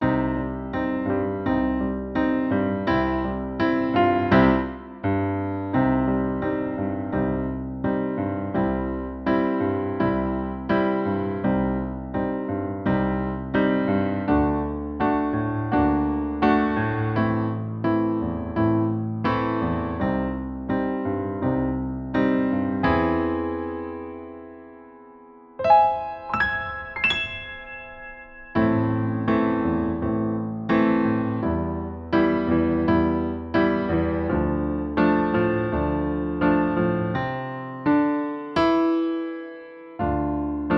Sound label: duet chords